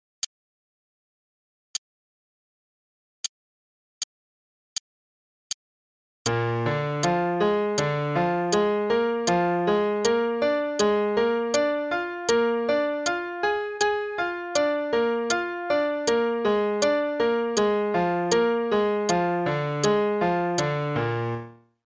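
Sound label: Broken Triads to the Octave only (Eb Major, quarter note=80); CM Level 4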